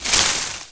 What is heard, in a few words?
bush